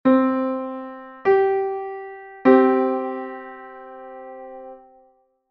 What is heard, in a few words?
0-685,71 fifth (7-EDO)